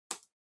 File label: PC Keyboard Far Enter Mid